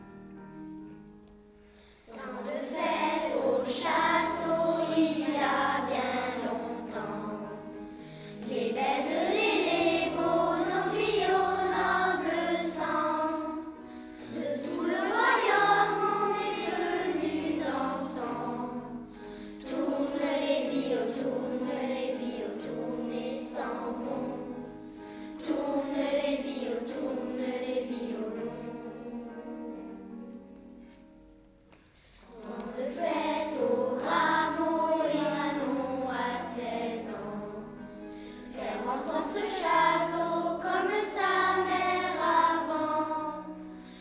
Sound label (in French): violons